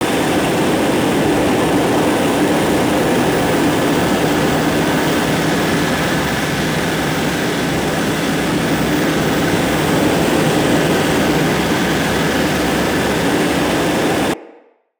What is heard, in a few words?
Arch Wing Movement Idle Loop; Vehicles